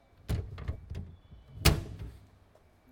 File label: close; 自販機フタ開閉音; 矢野口駅[JR東日本・南武線]